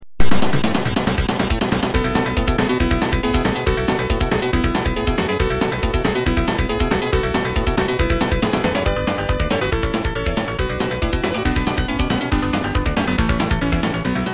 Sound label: cart music level 7